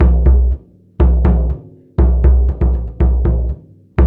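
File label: 120 bpm, ethno drums, low drums; Spectrasonics - Supreme Beats - World Dance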